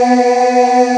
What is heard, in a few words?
Choir; awe32